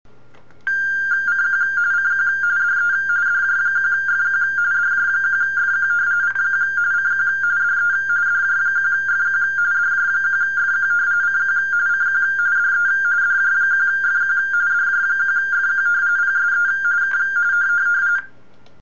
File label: rtty